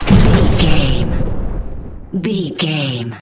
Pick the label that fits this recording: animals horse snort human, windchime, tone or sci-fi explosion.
sci-fi explosion